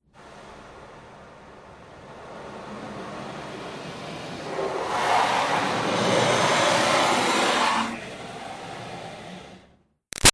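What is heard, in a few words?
Water Spray Interior Car By Soft Long; Car Wash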